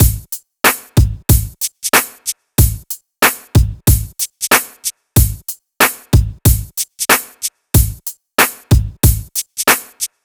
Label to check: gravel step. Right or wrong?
Wrong